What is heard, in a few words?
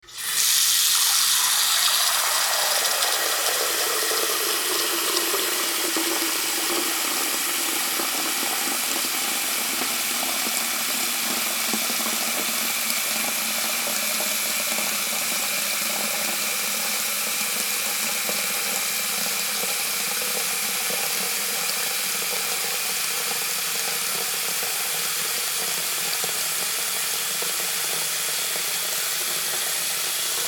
Sink Fill